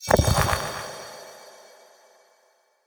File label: Memory Go Board Win; Warframe; Sacrifice